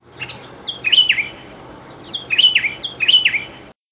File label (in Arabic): بلبل